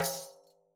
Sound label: daf Single hit; Slate; SEISMIC Drum Sample Pack